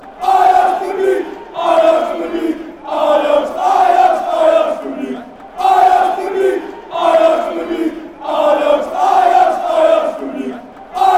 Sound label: ajax public, fanchants